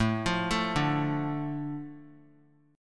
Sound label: A Suspended 4th Sharp 5th; Asus4#5 arpeggiated; JGuitar